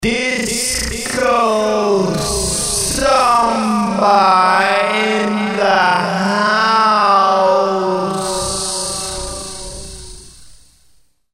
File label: samba